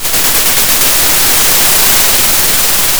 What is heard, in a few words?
pop, Sound of a thunderstorm